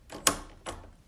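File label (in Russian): блокировки отпирания двери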